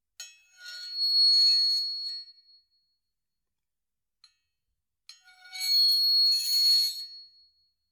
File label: Metal Scraping And Ringing